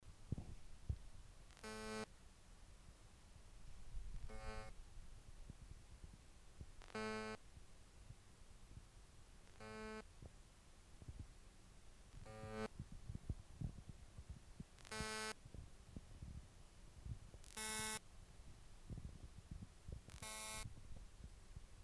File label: seagate spindle lock, damaged hdd sounds